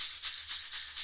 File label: rainstick performance; ETHNIC and WORLD PERCUSSION; Rainsticks